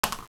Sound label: Single Roll; hyperspace-roll